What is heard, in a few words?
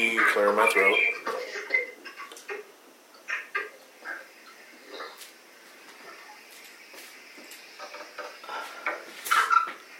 exhale; monroe house hartford in